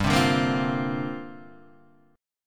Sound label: C 7th Suspended 2nd Suspended 4th, G